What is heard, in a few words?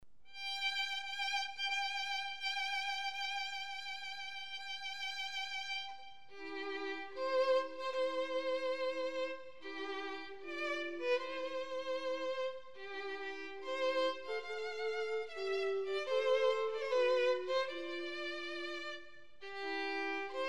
Bridal Chorus